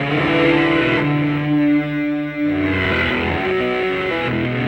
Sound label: Monster Hum, Electric Guitar; Sonic Foundry (Sony Creative Software); Friendly Fire; Wrath